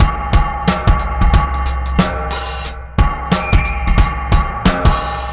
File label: drm 090, Breakbeat, 090bpm; Synthi Strut